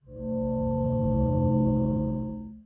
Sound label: bass resyn